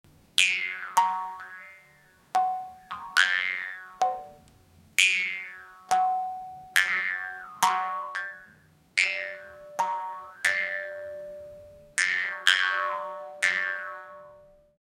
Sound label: jaw harp boing sound, playful